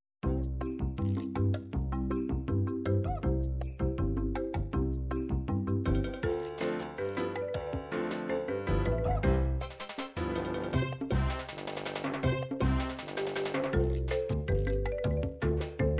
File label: cha cha, midi